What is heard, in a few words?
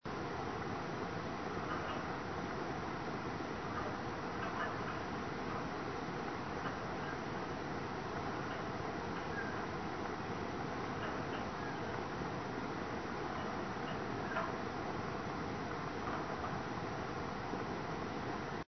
bedsprings